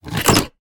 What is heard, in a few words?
Treasure Chest Open; Idle Champions of the Forgotten Realms